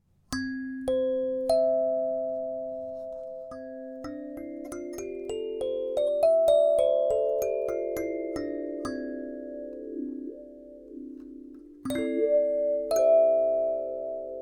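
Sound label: Sansa C Major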